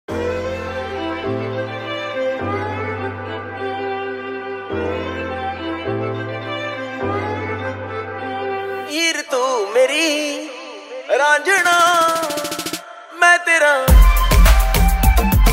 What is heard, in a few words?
song ringtone